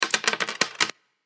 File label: coin drop short